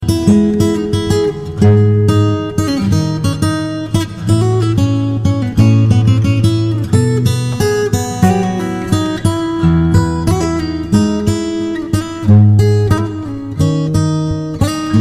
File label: Acoustic